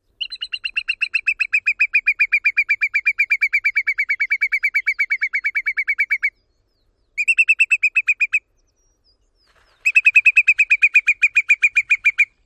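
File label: Whimbrel, flight alarm calls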